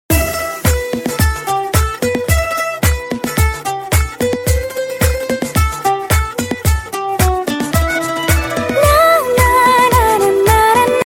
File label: instrumental with humming ringtone